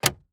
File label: Truck Ford Ranger Close Glove Box Interior; transportation